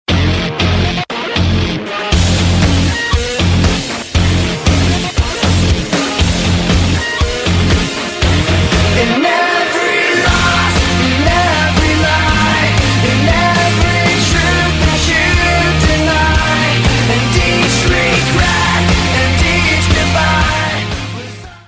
Summer Rock

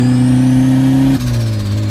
Blow Off Valve

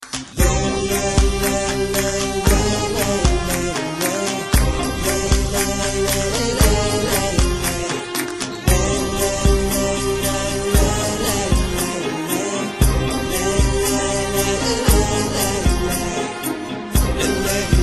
Humming

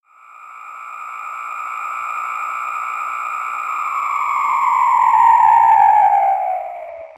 Sci Fi Sound

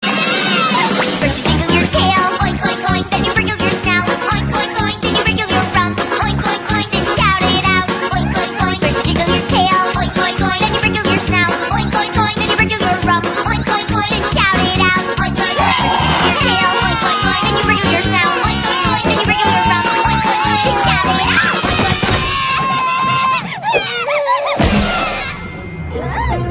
Oink Oink Oink Song

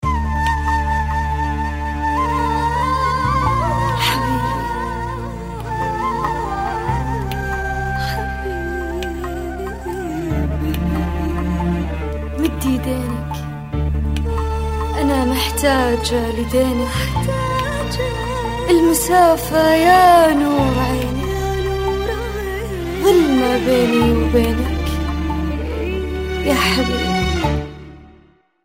Arabic Love

